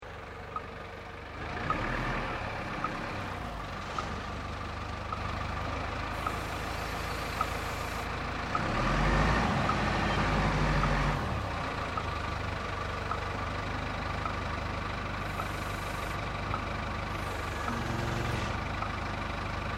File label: Tractor Earthmover Slow Maneauvering; Tractors (Sound Effects:Vehicles)